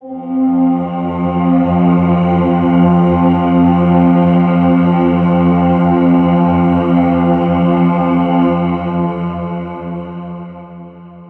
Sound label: the choir